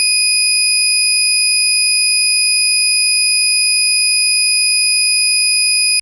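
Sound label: FM Sawtooth DX100 D#8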